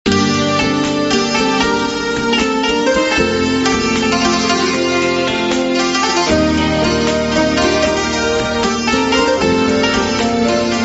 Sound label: Accordion